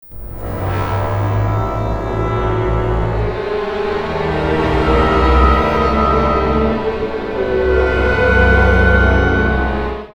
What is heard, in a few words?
orchestral fragment